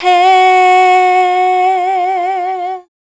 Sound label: Dance Vocals, FEMALE